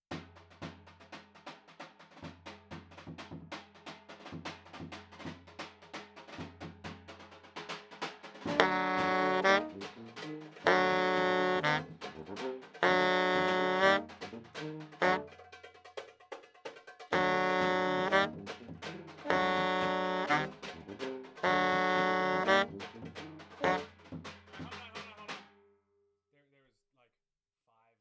baritone sax

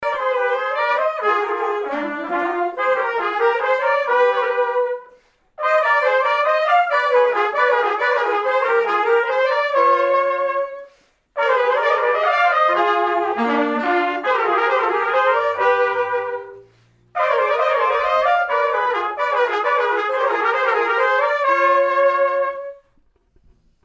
melody C

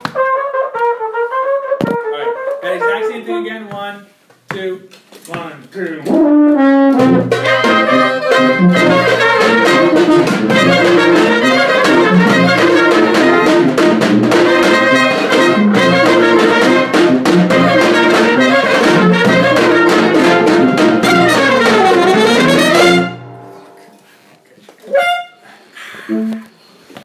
Weddding End, rehearsal

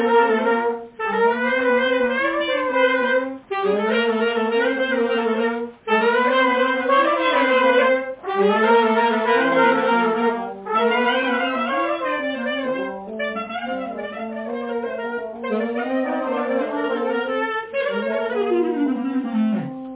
thailand shuffle D1

